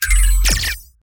ui battleover reduce

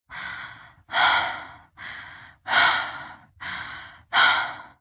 rapid breath female

